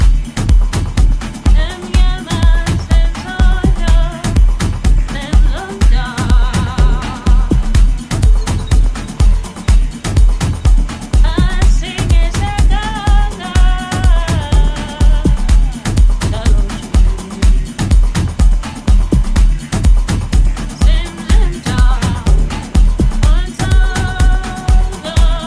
ALT VOC

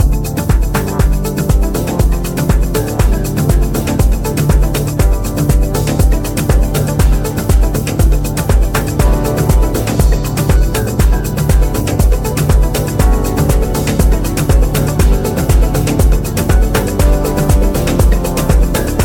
INST

